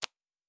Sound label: Cards Place; Fantasy Interface; Fantasy Sounds Bundle